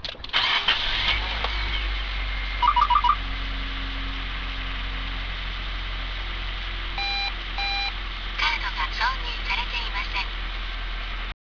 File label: start sound, car; エンジンスタート時; スターターモーターを交換しました; 軽やかなスタート音; エンジンスタート時の異音を無くすために，スターターモーターを交換しました． 併せてベルト関係の調整を行い軽やかなスタート音